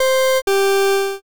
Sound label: time up